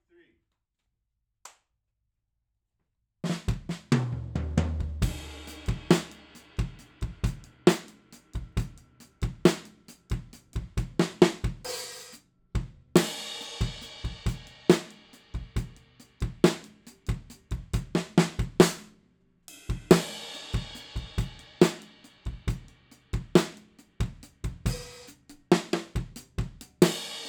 drums

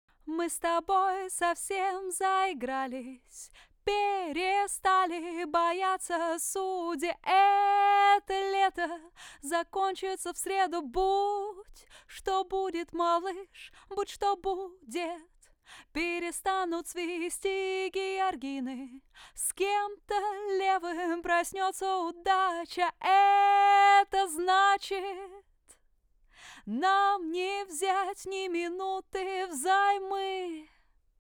Telefunken U47

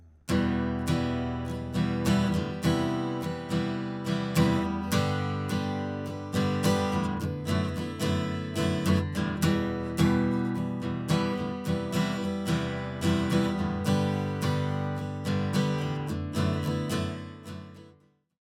GUITAR

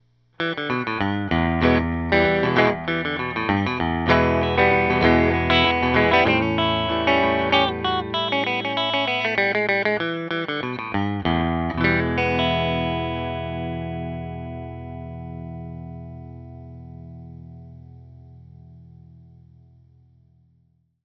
EL GUITAR TUBE

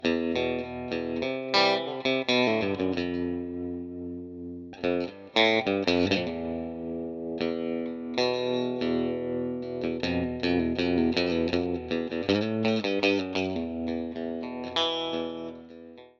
electric guitar; Bomblet

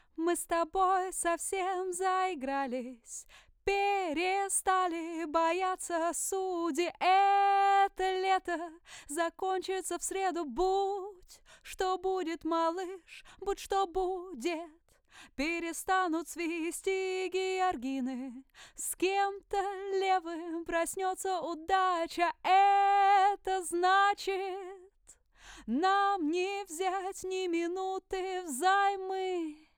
AKG 414B ULS

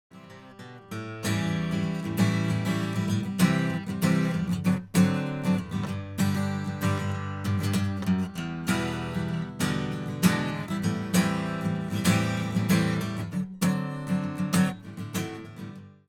steel

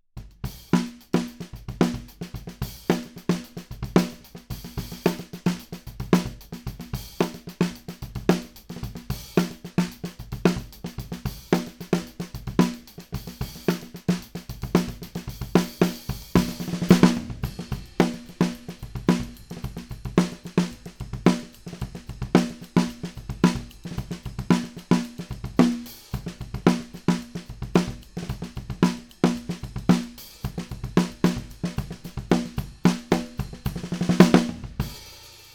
SNARE; BOMBLET

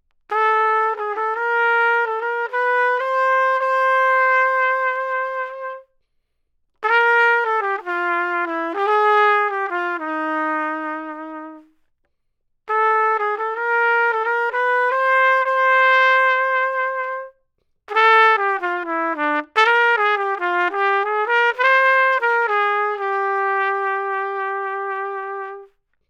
trumpet; FET

